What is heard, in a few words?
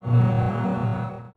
teleport fade in; Net Power 2000 #2